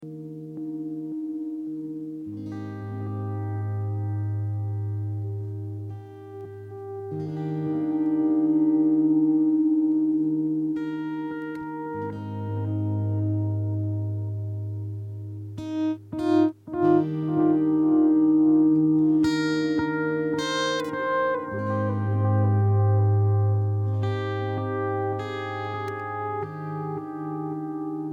D 100 GTR Vol Ped impro

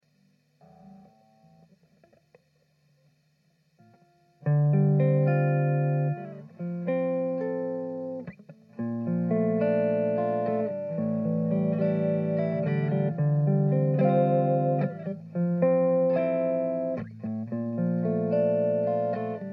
Dm 110 Jazzy GTR arp

